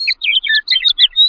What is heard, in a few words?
Birds; Buggy; PS1